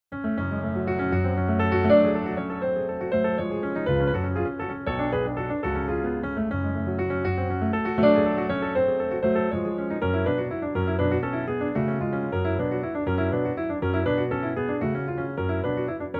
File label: Piano agitato; Lieder ohne Worte Op. 19 Nr. 5